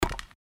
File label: step wood, footsteps; veloren